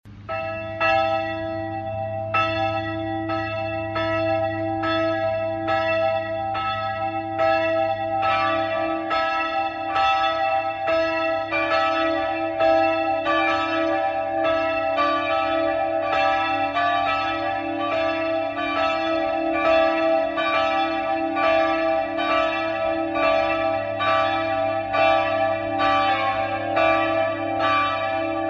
Tonaufnahme cis2 d2 e2, Mehrstimmige Geläute

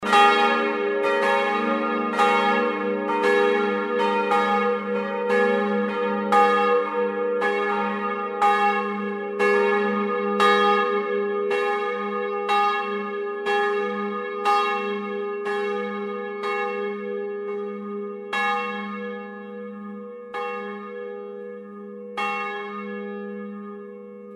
Tonaufnahme; as1 b1; mehrstimmige gebrauchte Geläute

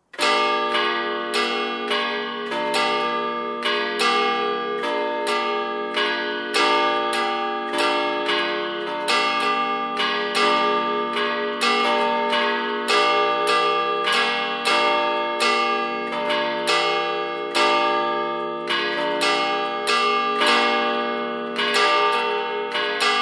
Tonaufnahme d1 f1 g1; mehrstimmige gebrauchte Geläute

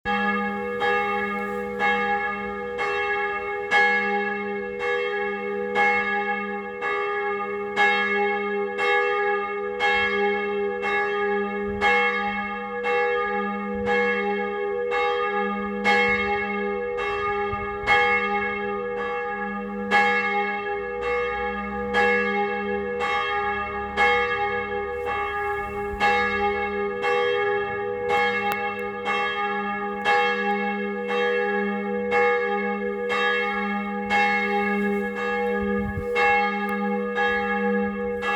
Tonaufnahme gis1, einzelglocken

